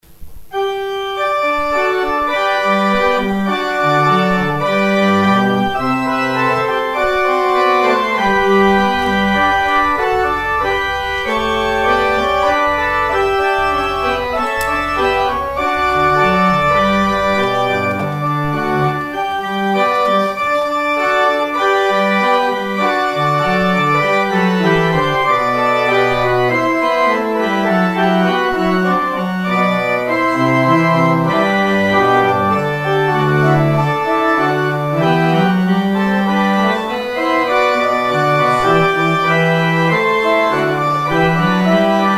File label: organ outro; Organ exit music